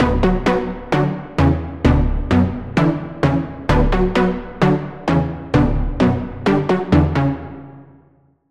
Pluck; Urban Host; Routed Sound; Sylenth1 Expansion - Street Vanquisher Vol.1

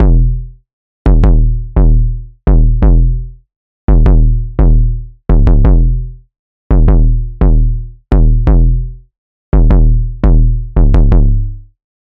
808 - Screwdriver; Routed Sound; Vital Expansion - Street Vanquisher Vol.1

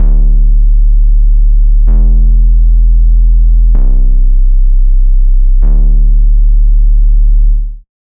Bass; Routed Sound; Serum 2 Expansion - Star Scavenger Vol.1

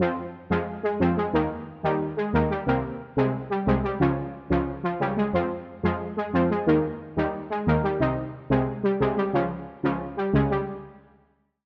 Lead, Spire; Modified Gravity; Routed Sound; Spire Expansion; Star Scavenger Vol.1

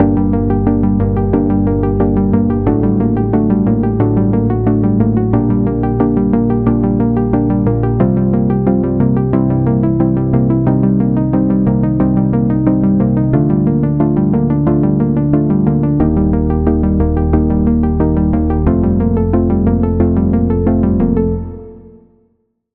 Pluck; Routed Sound; Sylenth1 Expansion - Hyper Gamer Vol.1

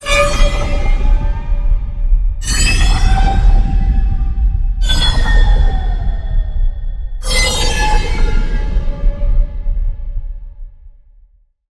SFX Metro Station; Sylenth1; Routed Sound; Sylenth1 Expansion - Quantum Enforcer Vol.1

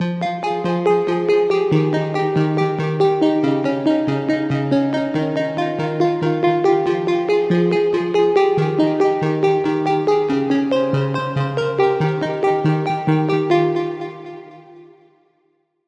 Key, Vital; Satellite; Routed Sound; Vital Expansion - Star Scavenger Vol.1

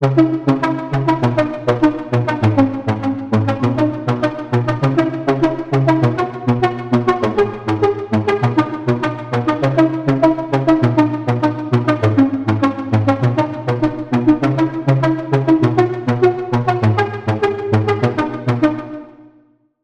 Lead; Obey; Routed Sound; Vital Expansion - Noble Gangster Vol.1

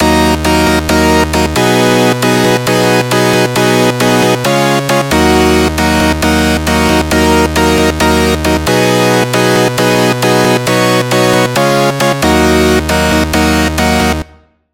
Chord; Routed Sound; Sylenth1 Expansion - Hyper Gamer Vol.1

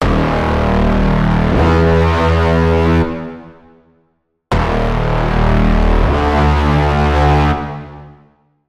Braam; Inchoation; Routed Sound; Vital Expansion - Quantum Enforcer Vol.1

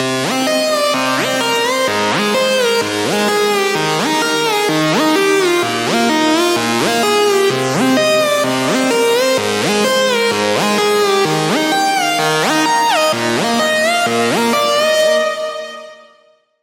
Lead; Routed Sound; Serum 2 Expansion - Star Scavenger Vol.1

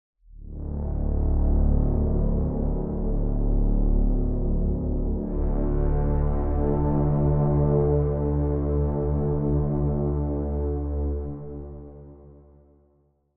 Pad, Sylenth1; Routed Sound; Sylenth1 Expansion - Quantum Enforcer Vol.1

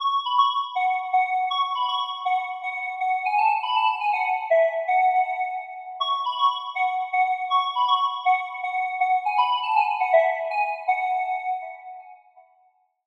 Woodwind - Medieval Piper; Routed Sound; Serum 2 Expansion; Story Walker Vol.1

